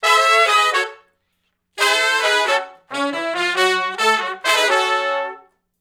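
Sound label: R&B Riff (F#) harmony, Horncraft; Sony Creative Software; Crimson Blue and Fabulous Horncraft 4 RnB; Horncraft for R&B; Sections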